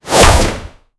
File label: action